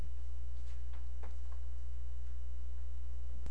throw

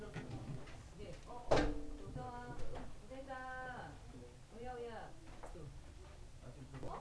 ambulance

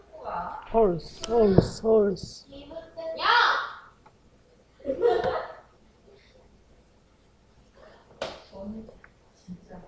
horse

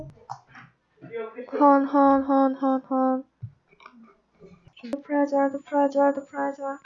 horn

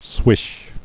swish

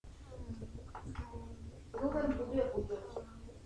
cow

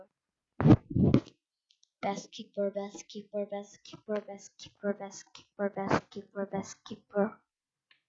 basketball

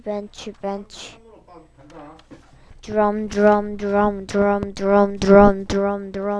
drum

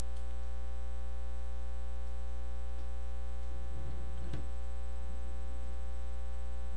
duckling

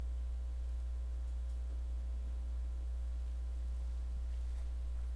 sweep

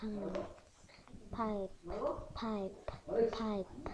pipe

